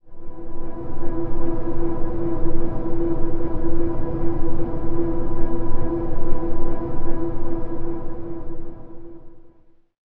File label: temple drone E, ancient drones; Zero-G Seismic Frequencies; 90 s Sample CDs